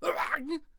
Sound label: Ice Archer Death Vocals